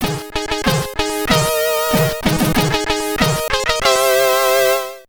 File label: Victory!; SID Stereo